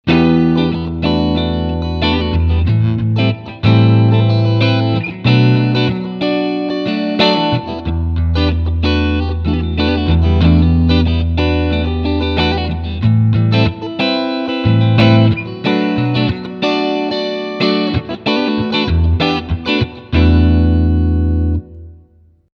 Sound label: Clean Single Coil